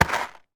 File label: industrial plastic box of small screws drop on carpeted floor